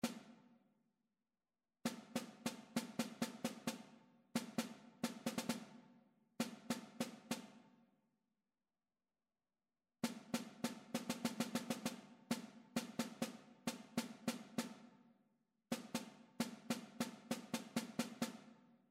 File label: rhythmic error detection